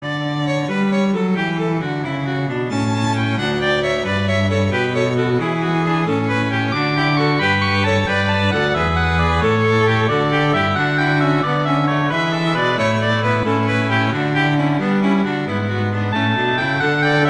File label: Gigue; Pachelbel; Canon